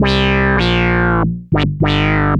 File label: ARP Odyssey, Arp A Line, synth samples; musicradar-synth-samples